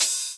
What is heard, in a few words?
HH Old Op